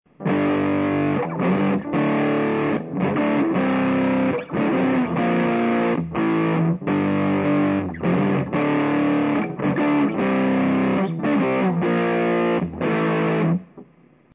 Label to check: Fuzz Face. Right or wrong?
Right